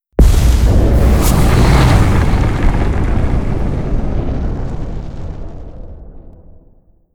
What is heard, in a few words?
shattered planet explosion; apocalypse